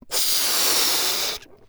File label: BALLOON Blow Up mono; Cielonos